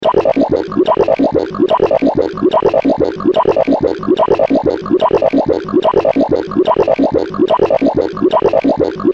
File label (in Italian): gorgoglii